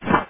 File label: splop